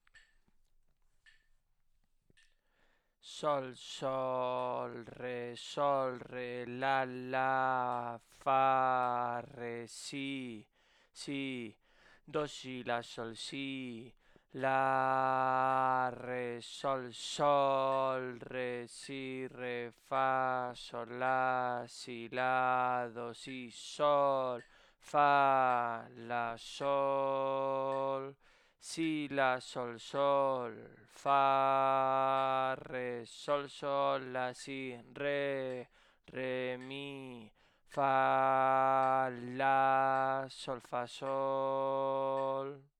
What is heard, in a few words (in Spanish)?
RITMO